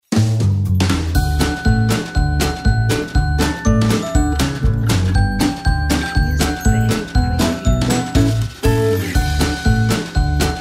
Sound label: Jingle Bells